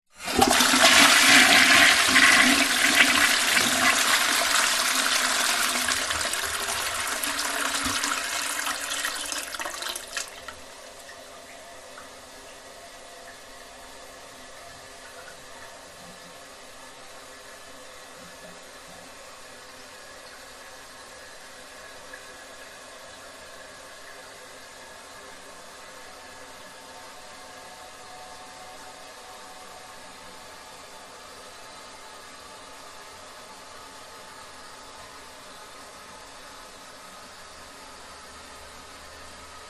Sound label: household toilet flush cistern fill; zapsplat